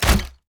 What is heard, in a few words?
death From Above attack shot; heroes onagro